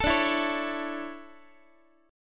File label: D Major 7th Suspended 2nd, Guitar